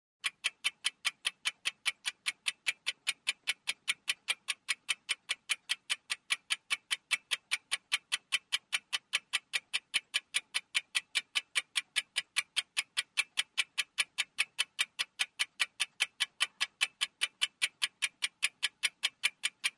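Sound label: Small Mantle Clock Tick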